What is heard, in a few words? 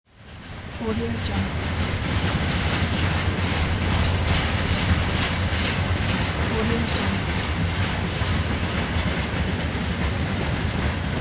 freight train